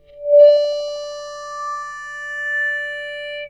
Guitar Textures

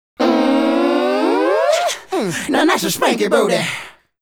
Male Group, Hip-Hop and RnB Vocals

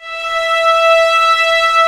VIOLIN E 4B; AKAI S-Series CD-ROM Sound Library VOL-8

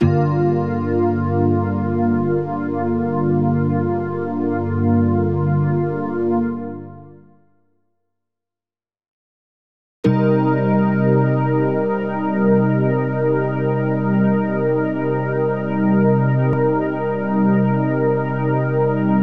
WAVE PAD

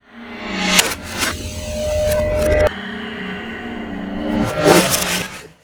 Waterphone Rush; Sonic Foundry (Sony Creative Software); The Electronic Music Manuscript Collection

